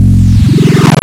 Crusher, wavetables, Analog; Massive 3-Analog-Electric

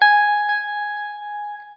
NOODLE LONG, Guitar & Bass; Quantum Leap; 90's Sample CDs

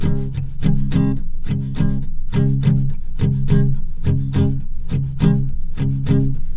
D 3 R, Guitars, SLOW SHUF; Spectrasonics; Hans Zimmer Guitars VOL-1

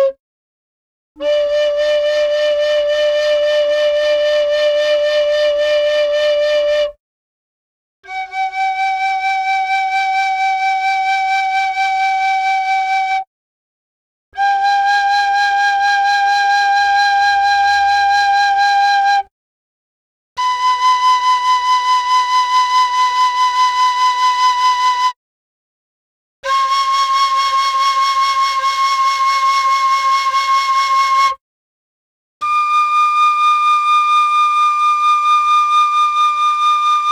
Gamelan; Propeller Island - Complete Gamelan

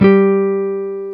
Jazz Guitar

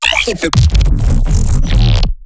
glitch stab; Virtual Riot; Glitch Stabs

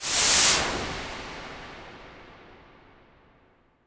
IMP WIPE, Cinematic Impact

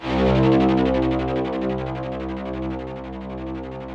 ROUGH STRNGS, Yamaha VP-1; Music Store

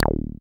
SYN 303, Roland TB-303

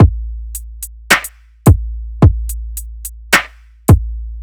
BEAT, Hip-Hop & R&B; USB Soundscan vol.47; AKAI

